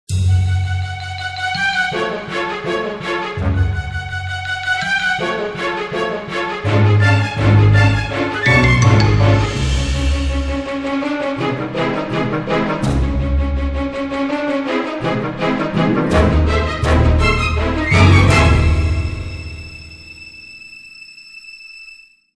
avec cymbales

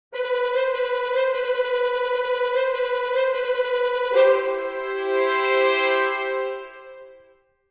Staccatos cuivres machine

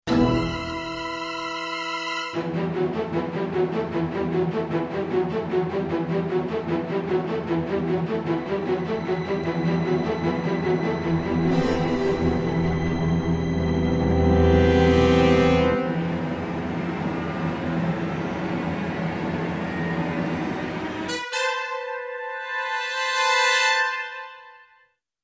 percu

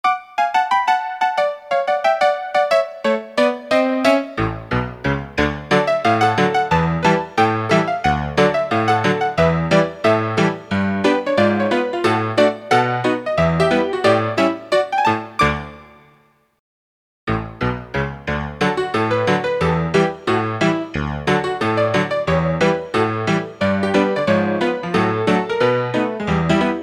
Piano bastringue; Musique inclassable

